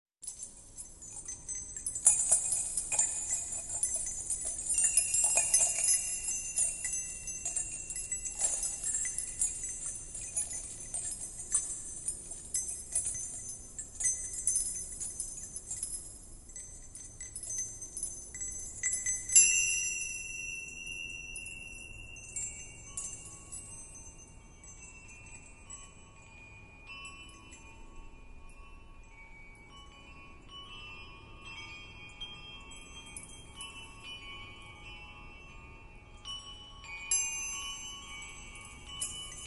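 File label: Chinese bells; Китайские перезвоны; Kitayskie kolokolchiki; Перезвон колокольчиков создаёт волшебные звуки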